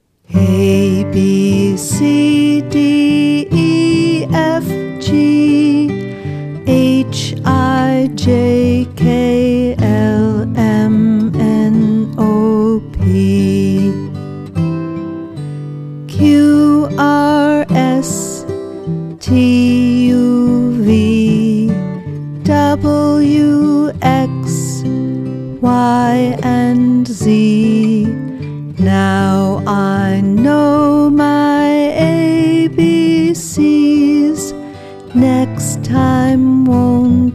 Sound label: alphabet song